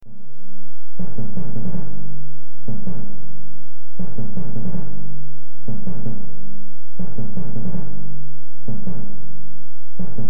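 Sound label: Loop timpani 160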